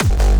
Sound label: G Reverse Bass; Drums; Kicks